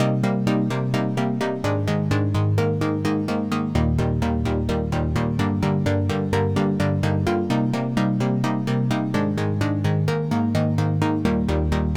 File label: Soft Chord Loop 128 BPM C min, Synths; Loops; Cymatics; EDM Starter Pack